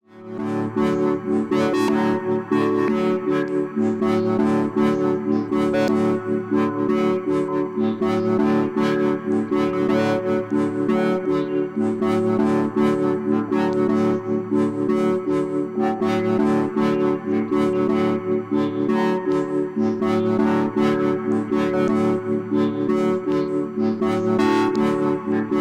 Preenfm3 FM Synth Reverb Filter Modulation BPM Sync